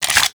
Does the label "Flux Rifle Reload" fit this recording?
Yes